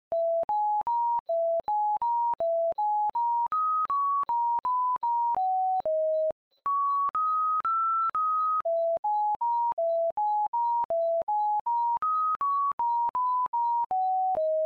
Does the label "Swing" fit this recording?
No